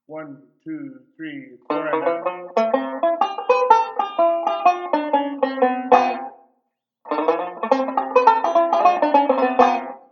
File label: Pick up Note Example, banjo; Beyond Chord Melody Volume II